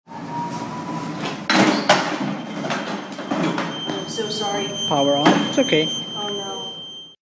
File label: unicycle CRASH